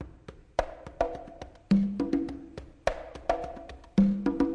105 bpm, congas; drum loops and breaks

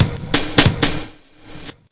174 heavymetal verb, drum loop; 161 to 180 bpm

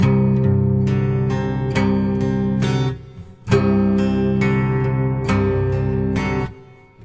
guitar; REMIXABLE COLLECTIONS; vincents moody guitar

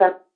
vox blip; percussive; REMIXABLE COLLECTIONS; Ze Franks Pain Pack; pain pack percussive and bips and clicks

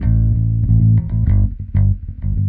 confident bass, synth and electronic loops

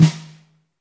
real drum kit; REAL LIVE KITS; A Real Freakin Drum Kit

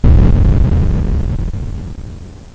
explode; VIDEO GAMES; tankbatt

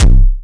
Syn Dr, Overloaded Synthetic Drums; Hip Hop; style kits

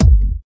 electro kick; STYLE KITS; Hip Hop; Boy In A Band Electro Kit

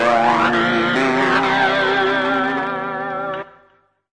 mystery guitar

